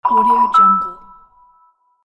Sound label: collect coins fast speed